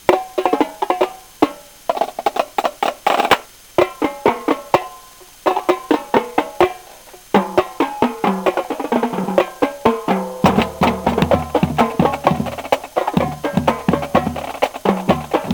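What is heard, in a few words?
whistle audio, drumline cadences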